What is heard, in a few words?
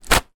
foley clothes shorts wet drop ground; zapsplat